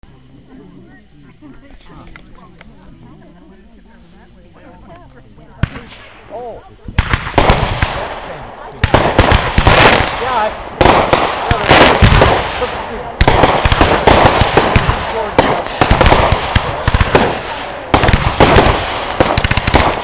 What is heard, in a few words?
fireworks; 4 of July 2009. 1minute15seconds.